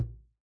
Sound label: Stop; Lock A Luck